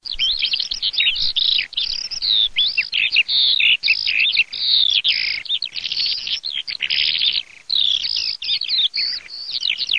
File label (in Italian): ALLODOLA CALANDRA